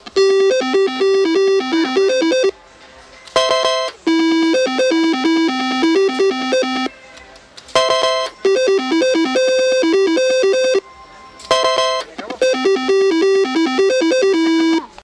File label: Playing A Slot Machine